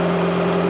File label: drone motor loop; Nickelodeon Basketball Stars 3; ThrillGames